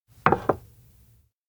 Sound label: coffee cup place; anemoi